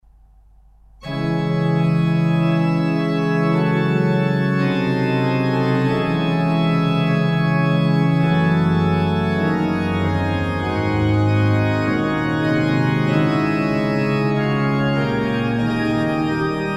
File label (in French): Plein Jeu Gravement, orgue; Defrance Jean Charles